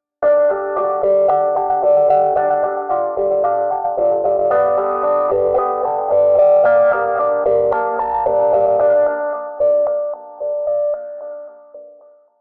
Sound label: Intro Piano WET